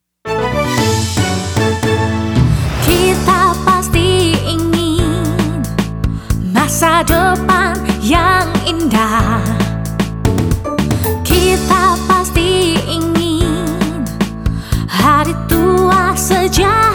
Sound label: Jingle